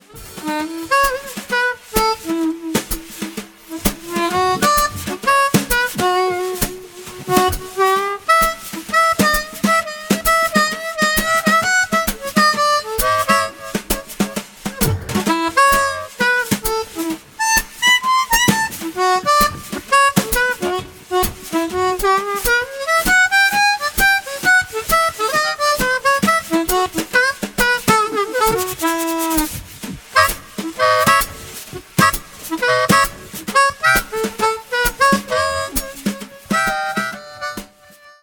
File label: Jingle Bells, harp